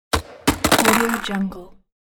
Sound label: skateboard trick and crash